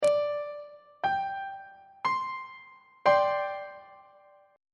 b sharp suspended 2nd 1st inversion on treble clef